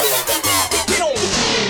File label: Fill 140 BPM; Vengeance Packs; vengeance essential club sounds-3; VEC3 Loops 140 BPM; VEC3 Fills 140 BPM